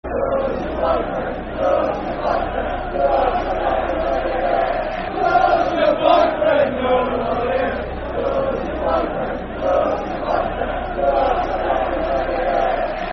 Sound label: Does Your Boyfriend Know You're Here Football Chant (Soccer Song); Bradford City Bcfc